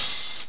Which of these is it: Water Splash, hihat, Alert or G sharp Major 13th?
hihat